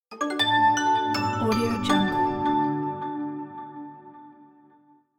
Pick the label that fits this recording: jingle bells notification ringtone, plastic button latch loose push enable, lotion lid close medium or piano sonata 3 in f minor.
jingle bells notification ringtone